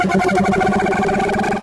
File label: charge